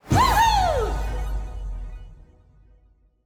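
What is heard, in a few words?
sfx general mission accomplished; Pachi Pachi 2 On a Roll; PS4 PS5 Switch gamerip 2022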